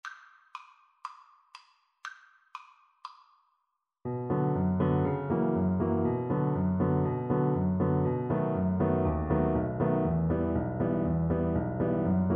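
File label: VLC karaoke, Cello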